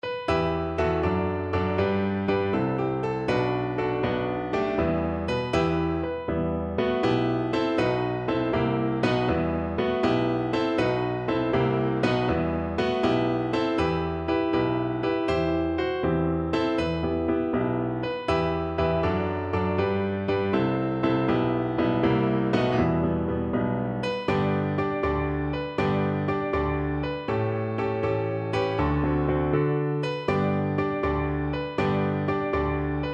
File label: piano